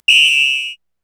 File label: WHISTLE, Korg EMX-1, drum machines